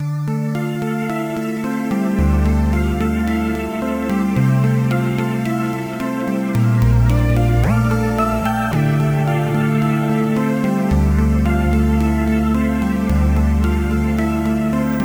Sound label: Groove Synthesis 3rd Soft WT Arpeggio